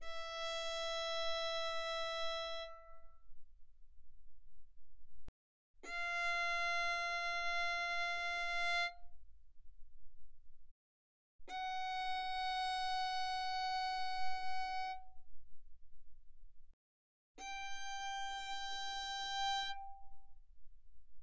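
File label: Violin arco mezzo forte sul E E5 B5; Strings; violin2012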